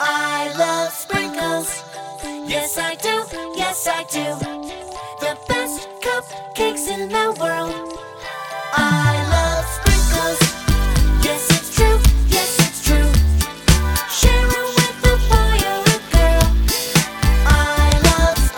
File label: jingle